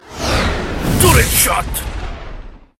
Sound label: Drill